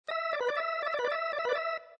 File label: lounge organ; Lounge & Reggae; Loopology; Be Bop Baby